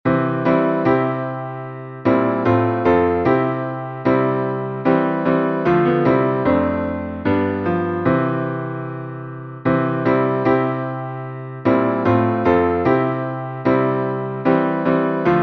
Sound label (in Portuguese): salmo instrumental